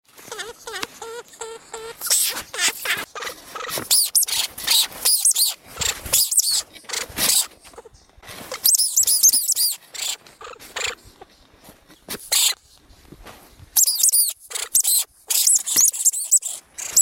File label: lemming